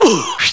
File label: BARFS, Alien